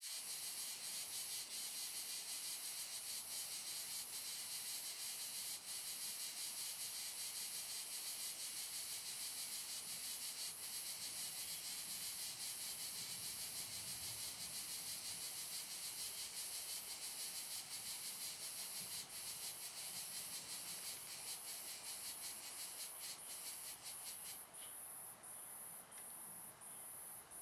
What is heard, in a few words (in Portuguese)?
Cigarra Solitaria Bem Marcada e Proxima ao Microfone; Cerrado; insetos; colecao sonora do cerrado